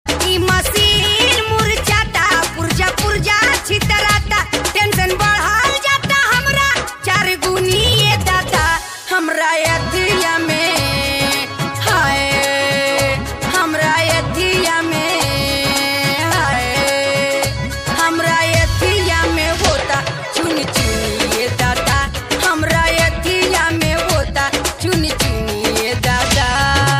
BHOJPURI RINGTONE

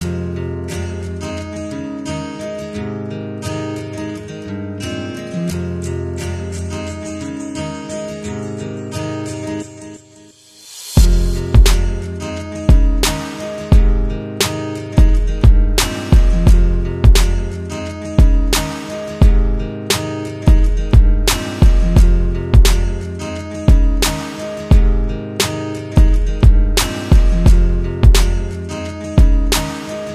acoustic guitar beat instrumental

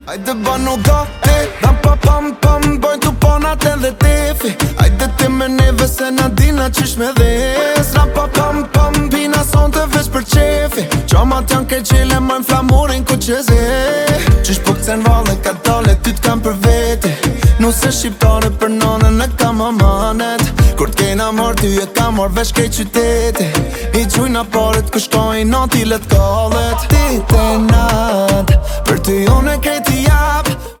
German ringtone

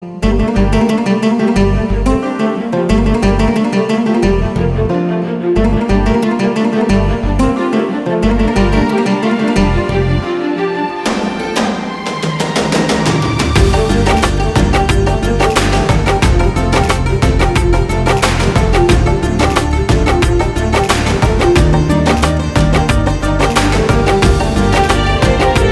INSTRUMENTAL MUSIC RINGTONE

